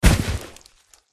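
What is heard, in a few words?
crystal golem attack contact bottom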